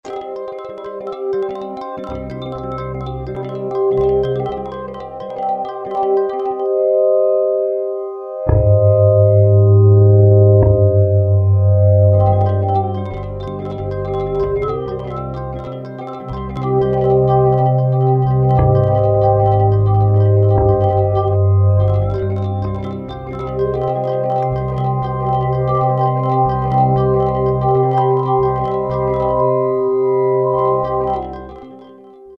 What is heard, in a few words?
Sfx Hemloc, E-mu Morpheus; Factory Presets